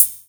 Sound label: Hi Hat Open; pitchglitch samples; Schafferdavid